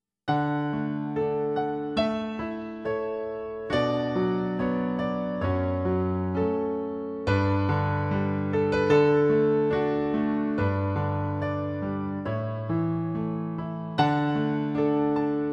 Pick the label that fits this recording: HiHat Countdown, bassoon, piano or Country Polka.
piano